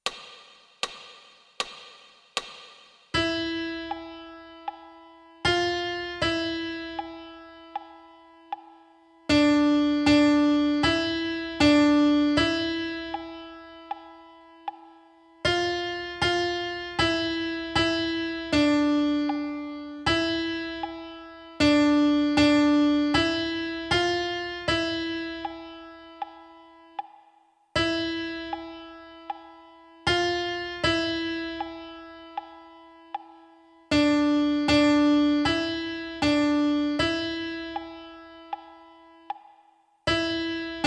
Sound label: Piano; Re mi fa